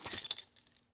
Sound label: Foley Keys Belt Metal Jingle